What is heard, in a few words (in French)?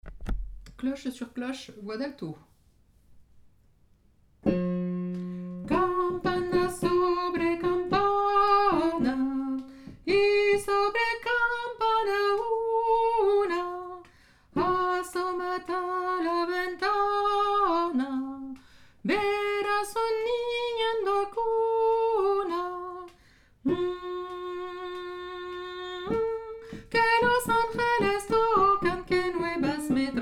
cloche sur cloche alto, chants noel